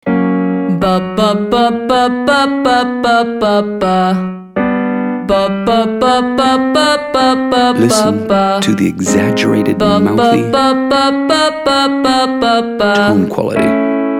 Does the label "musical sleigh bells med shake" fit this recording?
No